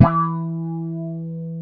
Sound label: WOW GT1-E2, WOW GUITAR; AKAI S-Series CD-ROM Sound Library VOL-7